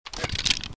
shotgun reload start, weapons

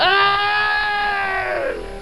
battle cry

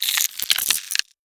click; gonarch

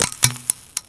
scanner photo; scmod

